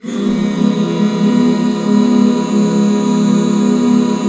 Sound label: Db 7, back chords; Sound & Vision - Gigapack II CD 1